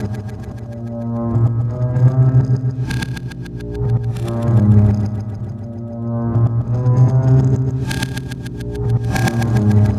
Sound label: Remixed Acoustic Bass